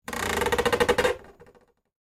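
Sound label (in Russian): скрип одиночный близкий нудный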